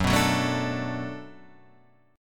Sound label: D 6th Gb, chord